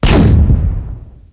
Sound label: rifle